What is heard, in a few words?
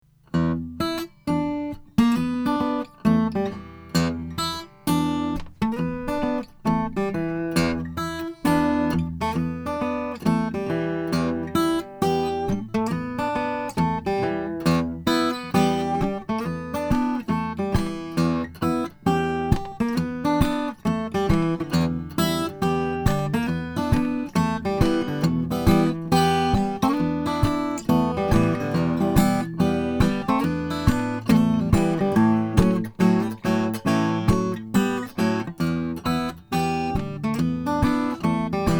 gc30 mahogany, guitars; flammang